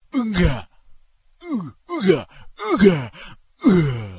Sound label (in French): Gorille